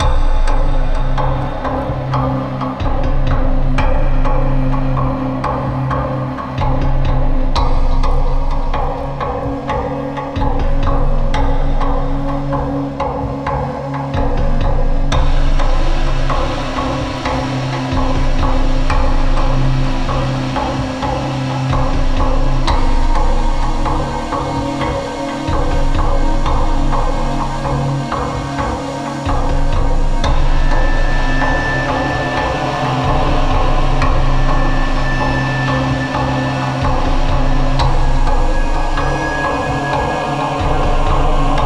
Battle Theme Persecution Danger Loop, RPG Fantasy Music; Sofiya and the Ancient Clan; PS4, PS5, Switch; RPG Fantasy Music Pack 02